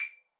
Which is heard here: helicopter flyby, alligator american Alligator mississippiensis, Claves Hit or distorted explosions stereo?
Claves Hit